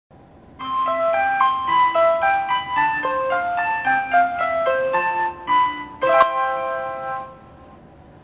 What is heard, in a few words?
chime; shikoku